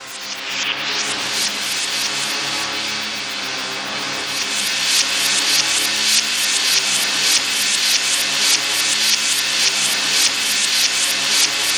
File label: INSECT, Special FX Twisted; USB Soundscan; AKAI